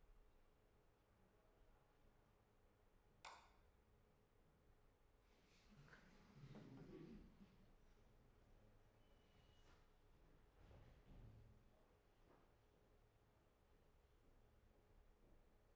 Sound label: Background noise